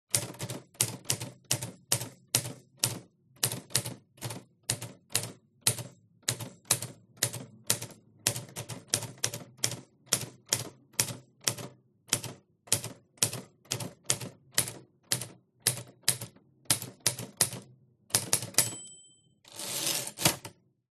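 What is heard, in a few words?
typewriter 1960 typing; Smith & Corona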